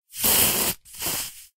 skunk spray; animalsvotive; Minecraft; Votive Content

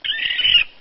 raccoon idle; animalsvotive; Minecraft; Votive 1.21.1; Votive Content

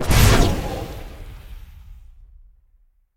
spell dark rune; minecraft; Votive Content

